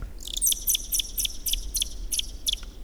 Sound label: Ochotona alpina trill; The trill is a song of the Altai pika